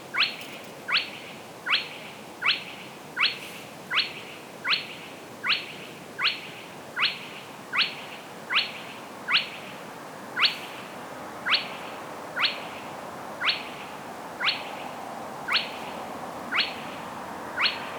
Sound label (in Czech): chřástal kropenatý Porzana porzana